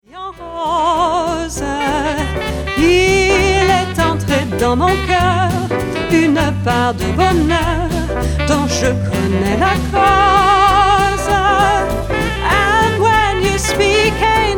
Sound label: french classics